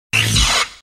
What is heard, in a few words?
Electric Spark Buzz; Hollywood Edge Sound Designers Tool Kit